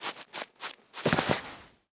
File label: shearing; Ambient; Zeus